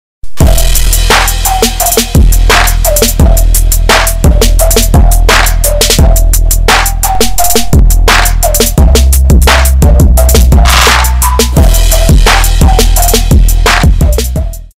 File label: TRAP